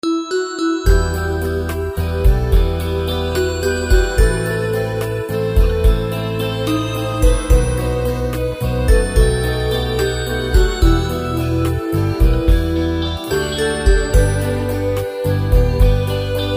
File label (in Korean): 종소리; ZTE; T21-Koryolink